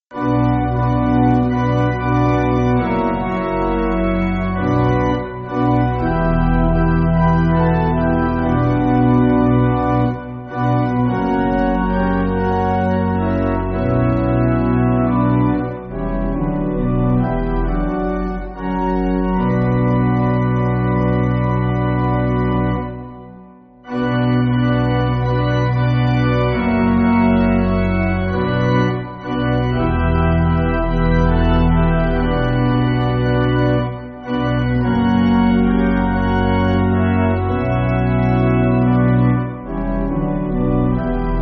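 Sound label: Pipe, Pipe Organ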